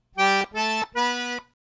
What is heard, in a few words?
RH Walk Up